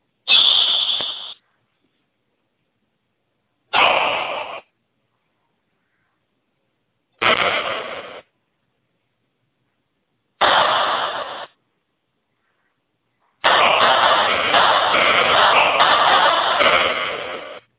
Fireworks

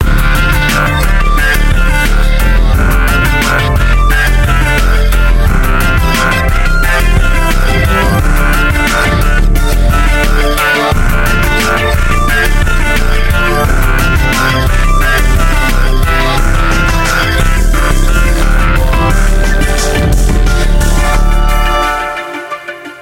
Robotic Chaotic

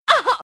voice female ahhuu

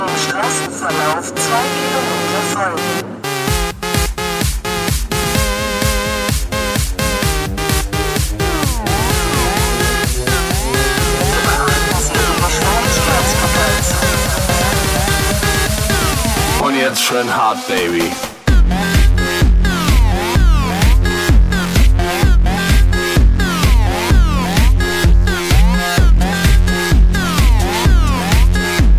Navi Remix; Tomtom

